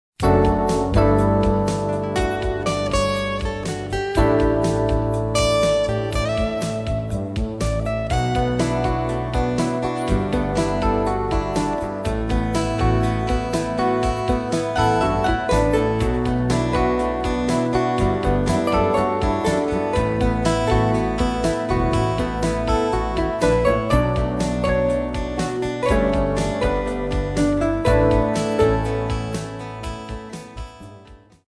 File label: contemporary american sound